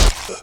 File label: pain, player